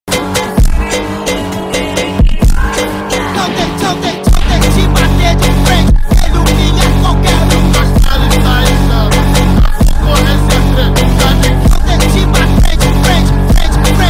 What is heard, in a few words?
brazilian phonk ringtone